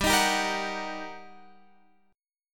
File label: G Minor Major 7th Sharp 5th, down, Guitar, Open F; GmM7#5 strummed; JGuitar